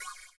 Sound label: CYM, Ethno Techno; ILIO - Ethno Techno